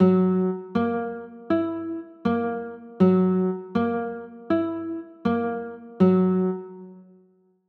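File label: G flat 6 chord, guitar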